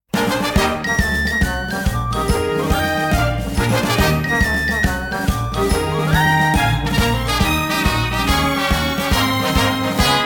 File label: Exit Music